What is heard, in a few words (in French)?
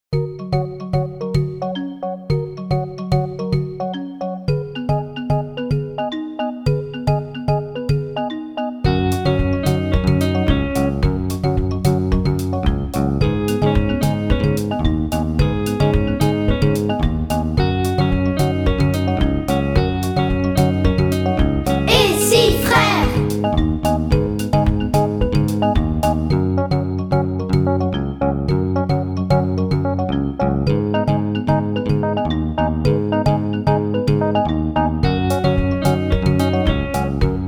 VERSION KARAOKE